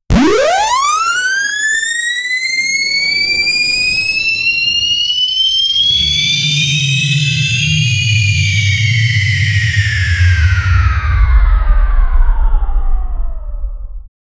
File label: rocket booster, launch